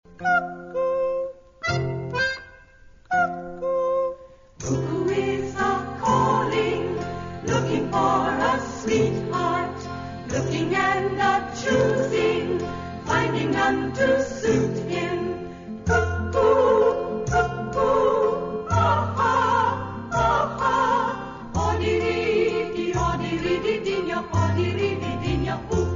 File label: Cuckoo